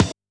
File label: DnB Breakbeat Hit; osu!